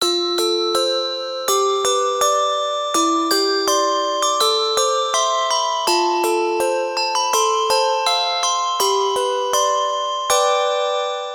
jingle handbell

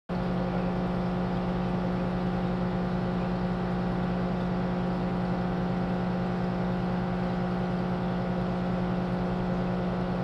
pomp

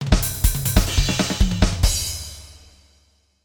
drums

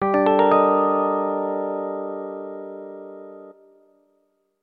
mystery

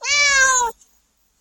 cat voice

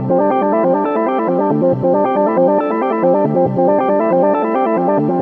slot

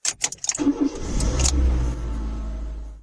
engine start

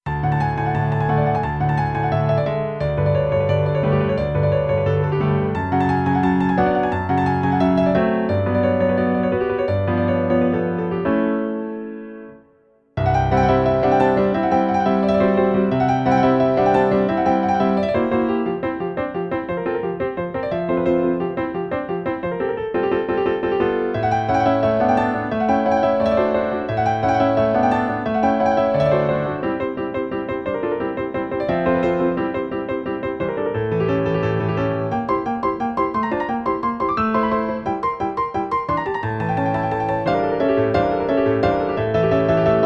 fast dance

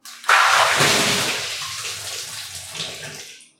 wearing water

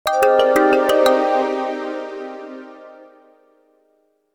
sound logo

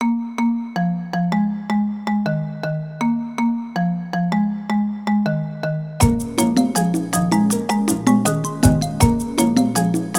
forest own

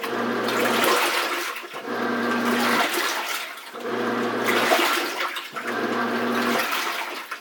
washer

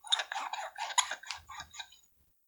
glass wash

